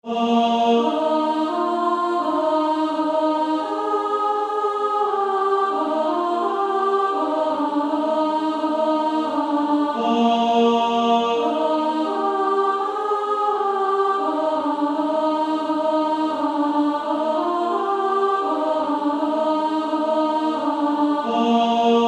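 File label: Female Choir soprano 1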